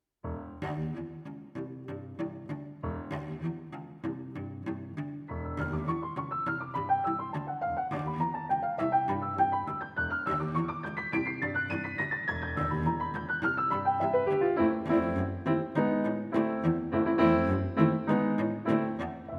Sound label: Prelude for Cello and Piano; 24 Preludes for Cello and Piano, Op. 178 Prelude No. 16 in B